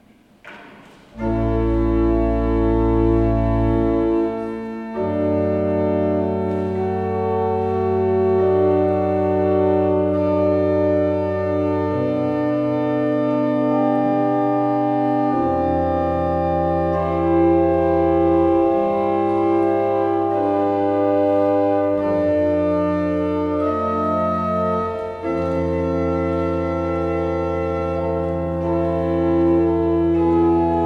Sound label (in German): Toccata, orgel; Domenico Zipoli